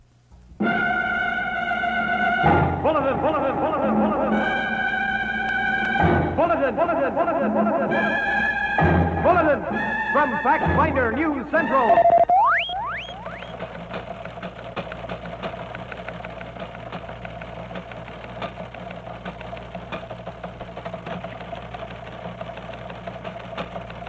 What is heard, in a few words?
bulletin sounder